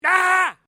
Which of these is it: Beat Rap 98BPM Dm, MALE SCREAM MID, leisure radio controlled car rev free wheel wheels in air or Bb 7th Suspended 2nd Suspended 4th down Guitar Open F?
MALE SCREAM MID